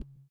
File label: THROAT; Spectrasonics; Metamorphosis; THROATERS